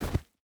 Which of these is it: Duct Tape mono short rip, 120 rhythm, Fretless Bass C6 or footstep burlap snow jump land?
footstep burlap snow jump land